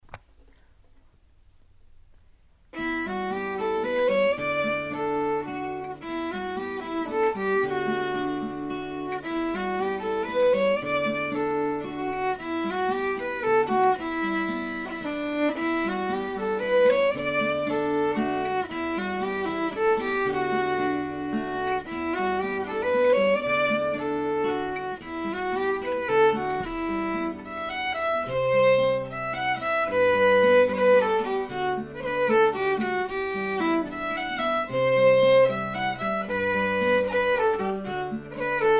Mazurka Limousin, Bear Dance

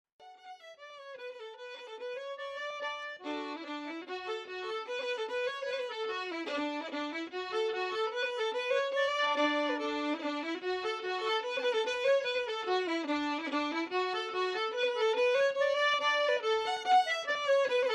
Polka

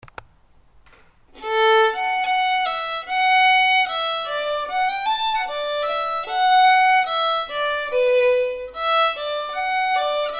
Air Tune, fiddle

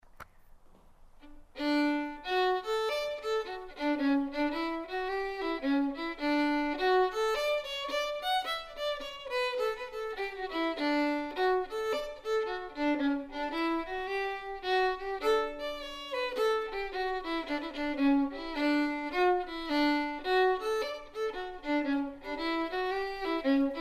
Galway hornpipe, fiddle

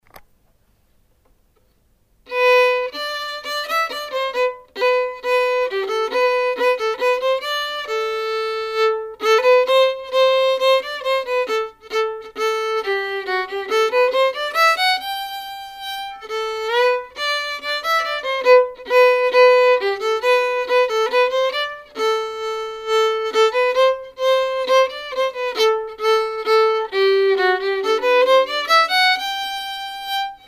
Polka, fiddle

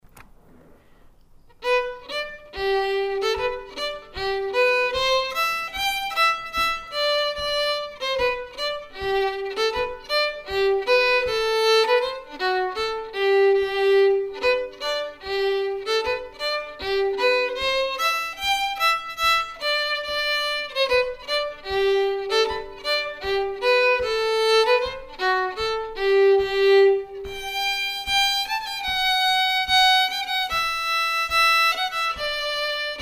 Polka, fiddle; Officer's Polka

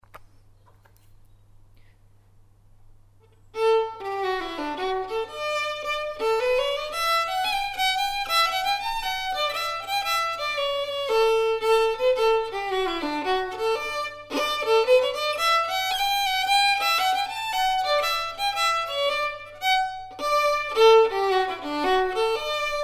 Hornpipe, fiddle; Sean Healy's Hornpipe

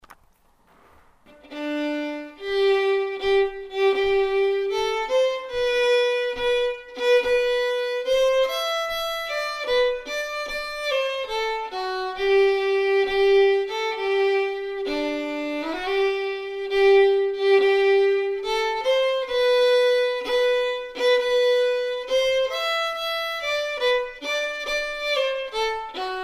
Swedish Masquerade slow, fiddle

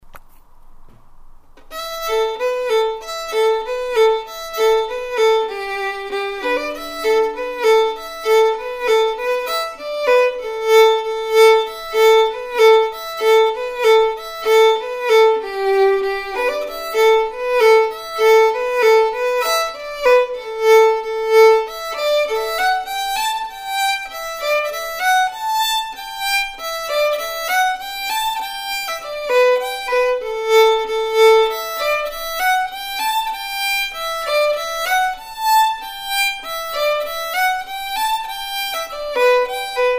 Polka, fiddle; Dalaigh's Polka

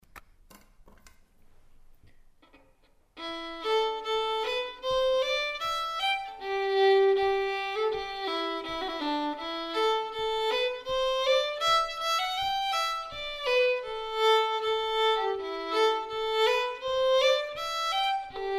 Ballydesmond Polka, fiddle; no.2 - Maurice Manley's

